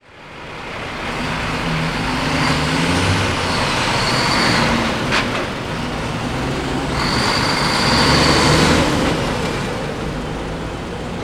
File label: truck Gears; vehicles